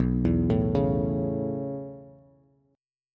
B 9th Suspended 4th B down Bass; B9sus4; arpeggiated; JGuitar

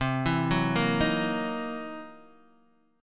C 7th Sharp 9th Flat 5th C Guitar Open D

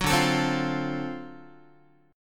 D 7th Sharp 9th, Guitar, chord; D7#9 strummed; JGuitar

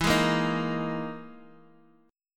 E Major 7th Flat 5th down Bass Standard; EM7b5 strummed; JGuitar

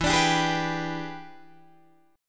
E 7th Sharp 9th Guitar Open D, chord

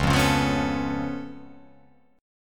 C sharp Augmented 7th, C sharp, down

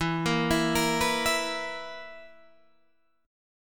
B Major 7th Suspended 4th E

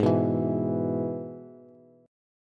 Ab Minor Sharp 5th Ab down Bass; Abm#5 strummed; JGuitar

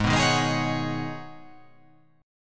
G sharp Minor 11th G sharp, chord

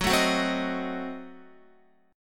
Gb Diminished 7th Guitar; strummed; JGuitar

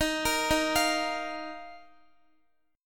D sharp Suspended 2nd, Guitar; D#sus2; arpeggiated; x,x,15,15,15,13

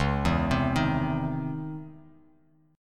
C sharp Minor Major 9th C sharp, chord; arpeggiated; 9,7,10,8

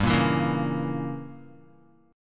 G Diminished 7th chord, down, Guitar; strummed; JGuitar

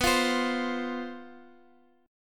C Major 7th Suspended 4th, guitar

